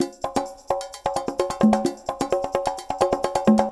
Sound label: Loop Percussion; drums pads